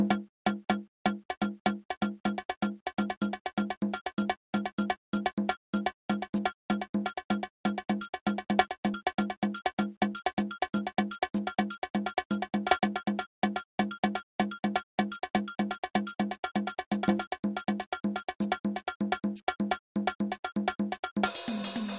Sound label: perc circle